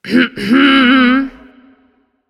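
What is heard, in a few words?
clear throat, female; smite; voicepack; hera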